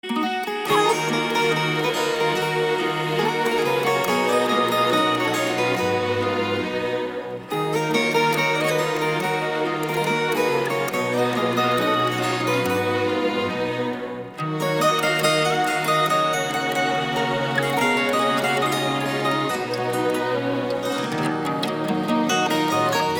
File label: Ring